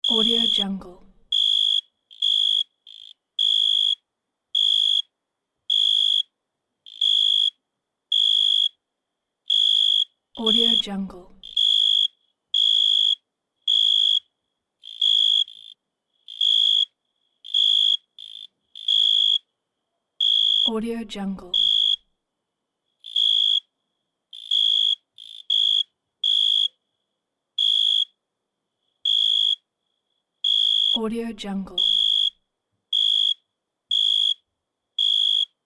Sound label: cricket night; justsoundeffects